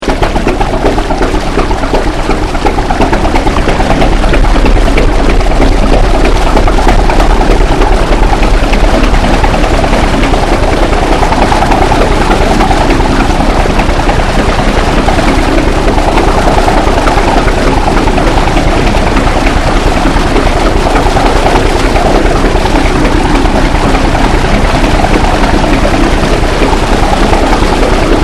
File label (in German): fahrt, jastram